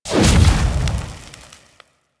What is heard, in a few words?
Ship Fire Ignite; Hazards; Warframe; Railjack